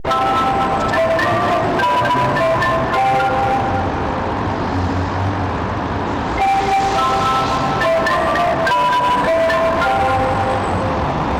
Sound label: big ben